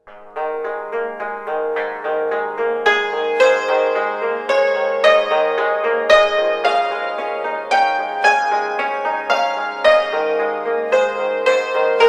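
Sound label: hymns and medleys on chinese hammered dulcimer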